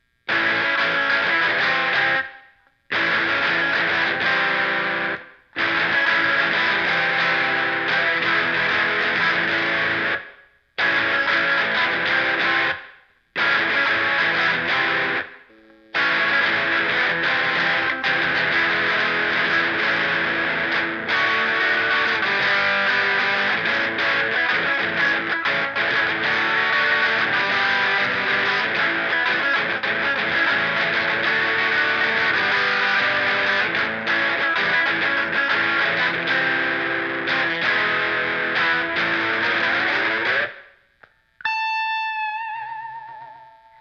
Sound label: electric